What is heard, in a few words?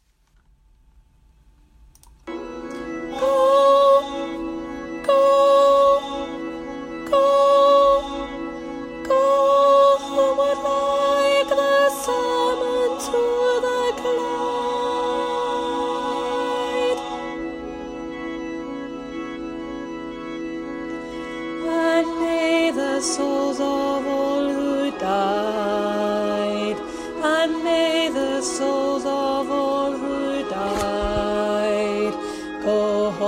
bass